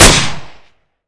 ppsh fire

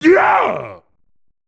pain; mr-satan

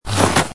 slide

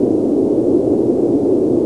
wnd snow, wind

